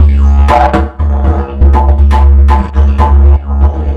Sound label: Dig Loop, Ethnic Instruments; Sonic Foundry (Sony Creative Software); The Electronic Music Manuscript Collection